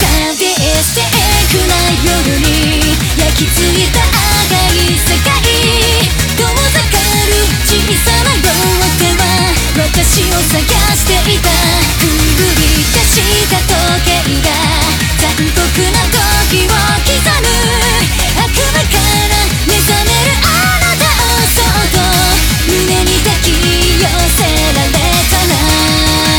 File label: Bgm Reach